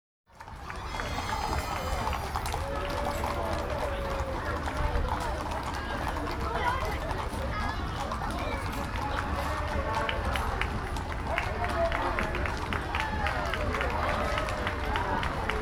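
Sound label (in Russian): Парад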